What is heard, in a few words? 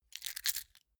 key ring large grab soft